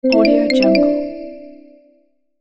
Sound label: ui notification